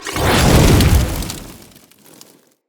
Fire Blade Activate; Characters; Enemy; Abilities; Sinecure; Banneret

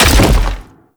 Land Default, Foley; Warframe; Sentients; Erra

